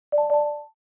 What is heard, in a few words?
Notification alert